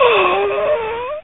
male hurt

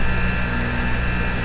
lights loop

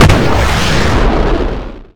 rocket human; ufoai; weapons

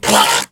hurt, aliens; ufoai

